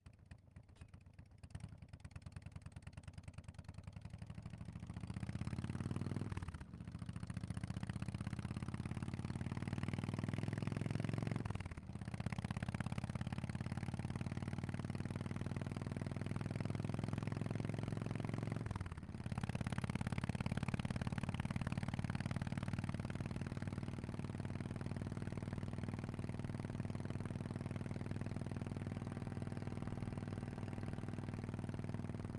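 harley davidson softail onboard medium drive various exhaust back; beta58; Sonniss GDC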